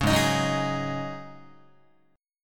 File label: G sharp 7th Suspended 4th G sharp, Guitar; G#7sus4; 4,4,6,4,7,7; strummed